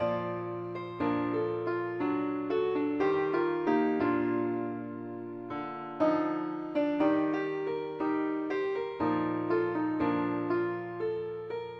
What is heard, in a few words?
keyboard only, key bes; pilot